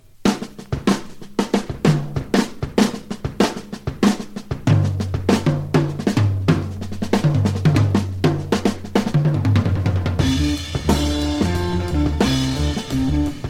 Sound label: jazz drum